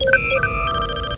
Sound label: beeps; Trek